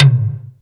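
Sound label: DRUMTRAKS high tom, Drums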